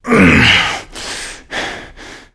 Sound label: player climb efforts